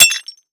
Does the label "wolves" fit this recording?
No